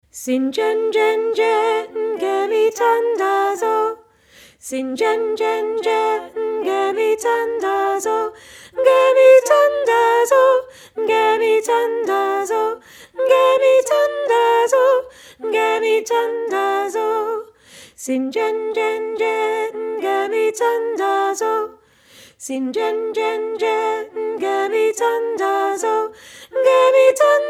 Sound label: harmony